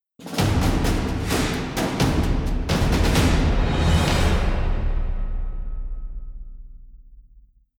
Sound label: Investigation Tense Outro; Toussaint